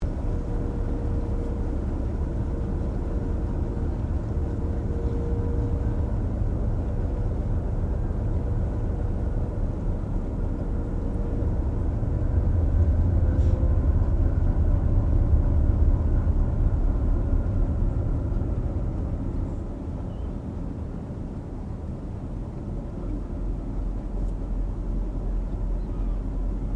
Boat Ferry Freshwater Class Sydney Engine Ambience Stereo; Manly Ferry; Boats; Vehicles